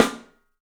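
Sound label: PICOLO SNRS, snare, drums; 3D Sound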